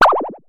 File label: Synare Filter; Star Instruments Synare 3